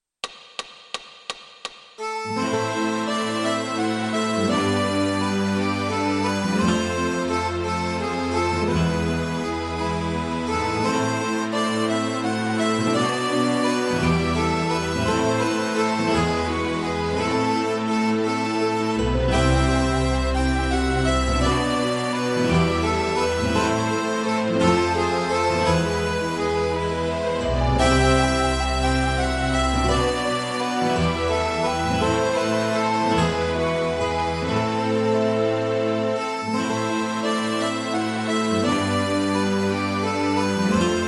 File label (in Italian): Fisa tutti